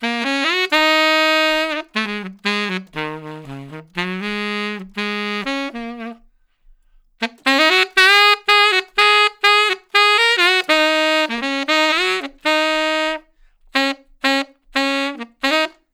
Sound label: Tenor Solo, Jazz, Traditional, Tenor Sax